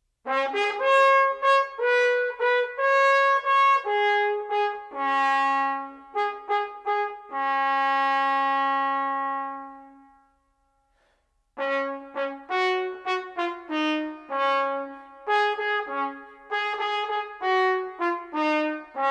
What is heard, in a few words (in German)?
musikinstrumentenmuseum zugtrompete